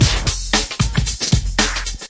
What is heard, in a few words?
eighties thickfunk, 114 bpm, drum loops and breaks